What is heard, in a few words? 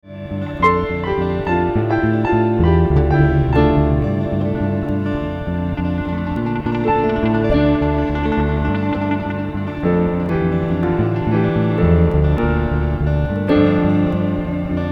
Piano, Electric Guitars And Bass